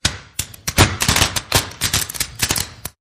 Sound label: Electrical Sparks